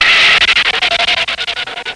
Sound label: Screech